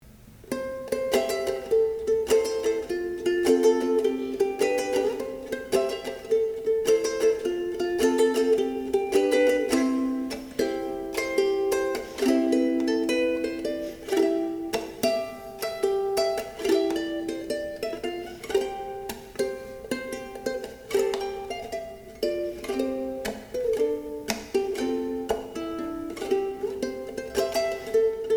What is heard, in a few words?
Uke